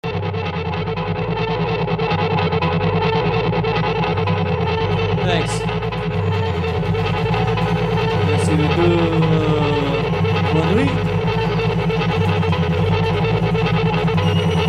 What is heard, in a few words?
Noise Feedback